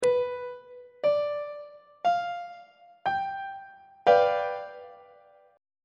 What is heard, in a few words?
a double flat dominant 7th 1st inversion on treble clef